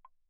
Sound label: granite block, pp; Percussion; hand percussion; graniteblocks